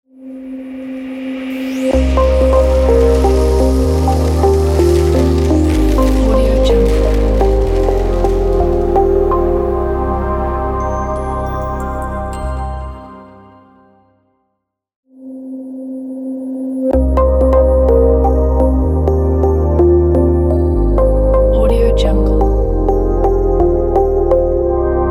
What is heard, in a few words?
glowing waves logo reveal; matsteiner-fx